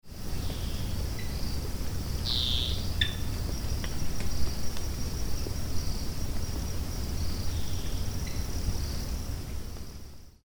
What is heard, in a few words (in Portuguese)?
Dixiphia pipra Cabeça branca